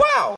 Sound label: Doge ringtone